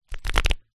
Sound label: household pocket photograph album wallet pages flight through